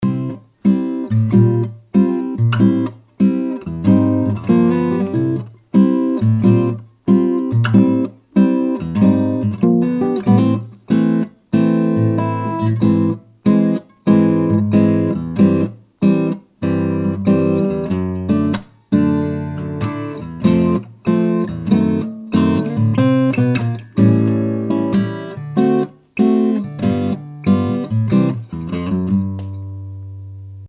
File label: parlour guitar cedar top